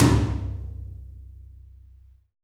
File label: SLAMTMLO; Drums In Your FACE!